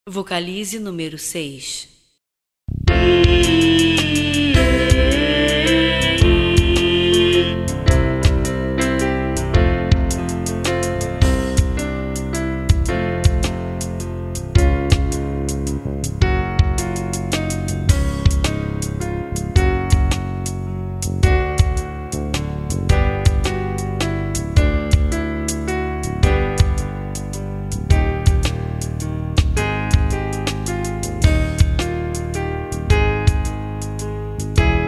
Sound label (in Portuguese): sem voz, vocalizes canto; exercicios canto